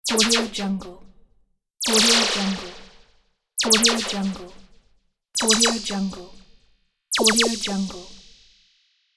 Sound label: laser beam